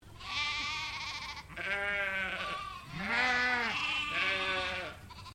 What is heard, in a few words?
Animal Sheep Dorper Group Baa; Sheep on a farm in Bright Victoria